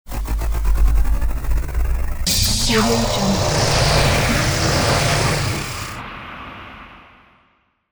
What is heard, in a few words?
futuristic engine start